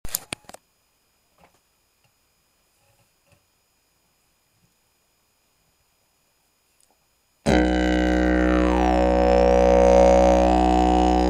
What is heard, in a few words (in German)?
Ahorn H